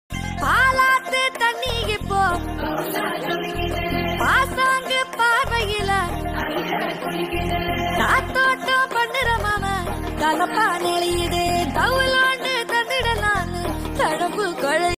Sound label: new song